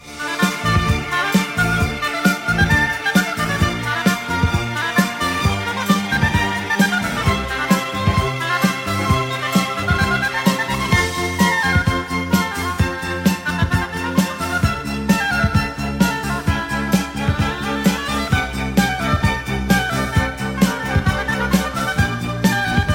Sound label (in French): sonnerie